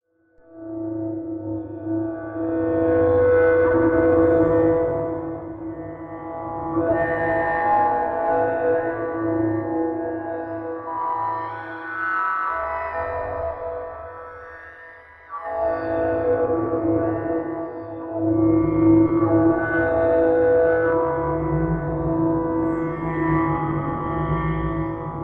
Message Sent Message From Distant Planet Ambient Reverb